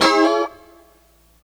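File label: D#4 A mono slide L, guitars; Spectrasonics; Hans Zimmer Guitars VOL-1; 90's Sample CDs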